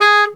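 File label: SAX STC G#2, Baritone Sax Staccato; Orchestral; VarazdinOrchestral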